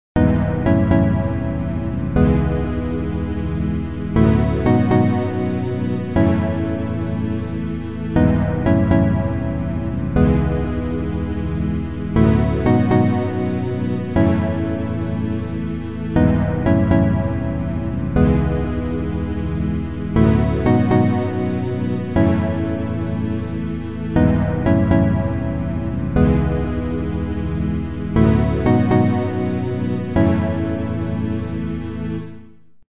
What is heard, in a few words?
bathroom tune